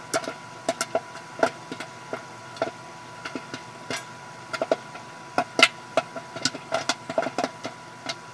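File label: popcorn machine loop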